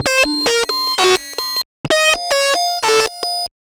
Geetar Stack, Underground UK House & Electro, Synths; Sonic Foundry (Sony Creative Software)